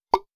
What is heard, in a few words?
Pop